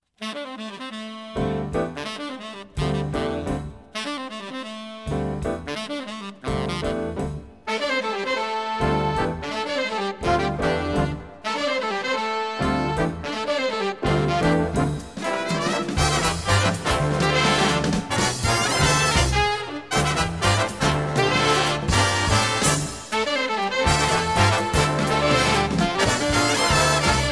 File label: Kicks and Sticks